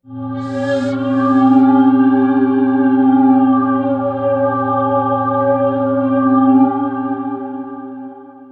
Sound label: Mystery Drone, Keyboards